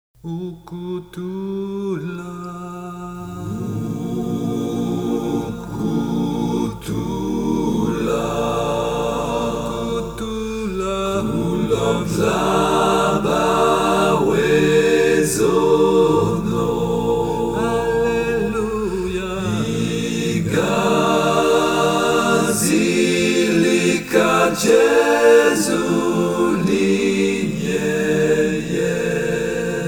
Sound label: Tutti; Ukuthula